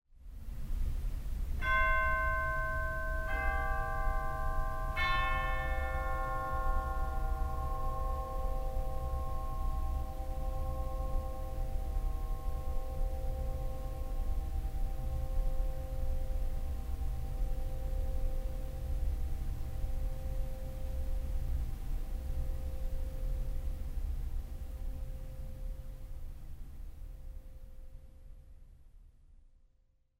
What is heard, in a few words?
Chimes, theatre organ